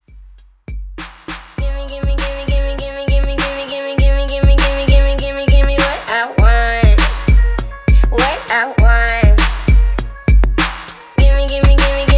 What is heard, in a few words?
HipHop Beat 100 BPM